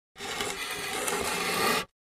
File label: 2x4 Dragged On Cement